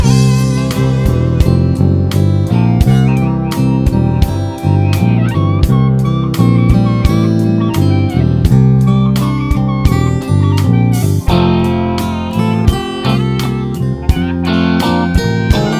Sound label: Slow Blues With Am in Ending